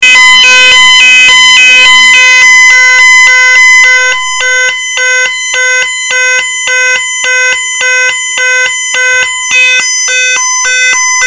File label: police, sir